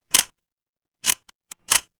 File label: office camera